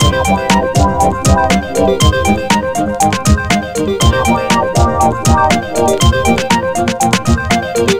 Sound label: electro, grooves